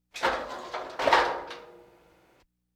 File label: Laugh tracks